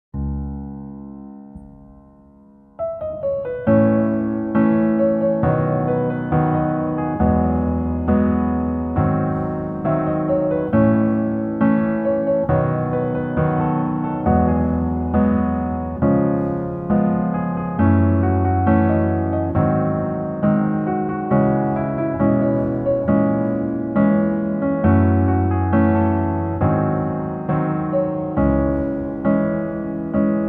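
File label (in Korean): Piano version 멜로디 MR -1키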